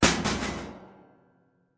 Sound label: Ventilation Fall; Game rip; MiSide